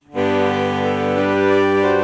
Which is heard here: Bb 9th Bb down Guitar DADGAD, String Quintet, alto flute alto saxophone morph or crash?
alto flute alto saxophone morph